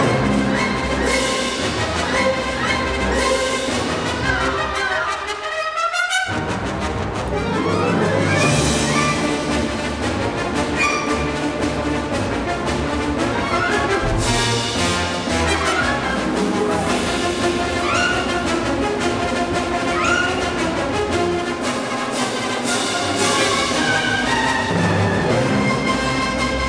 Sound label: Battle Loop